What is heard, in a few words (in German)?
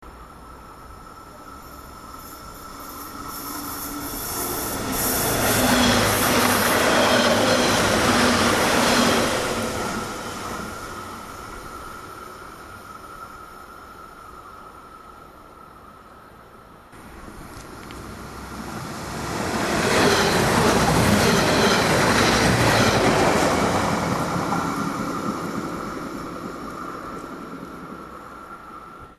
2 zuege einmal von rechts